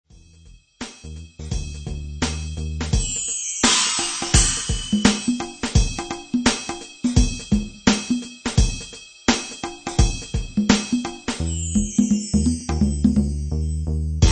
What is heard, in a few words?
cool drumz